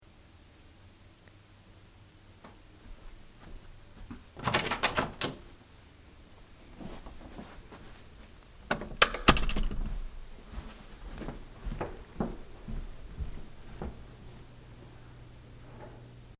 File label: binaural